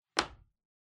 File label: Masking Tape Put Down